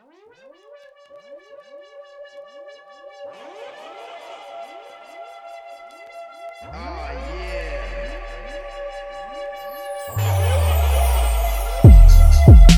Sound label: Turbulence